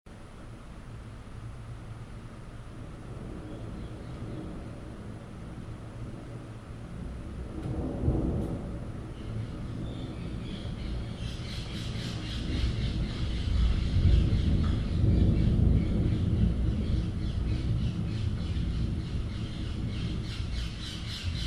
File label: thunderstorm and noisy birds